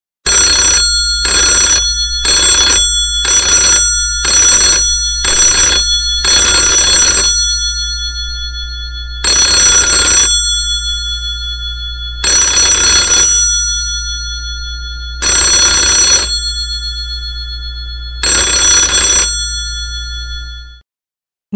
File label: ring mail